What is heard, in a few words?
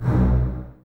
Basses B 1, String Ensembles; Miroslav Vitous; LINKS ORCH